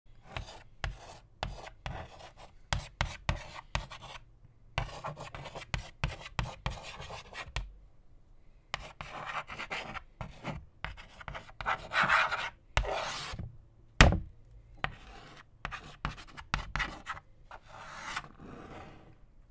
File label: Chalk On Chalkboard